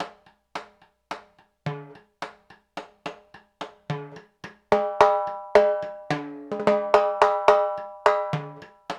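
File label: 108 bpm, Danzon; Spectrasonics; Supreme Beats; African Contemporary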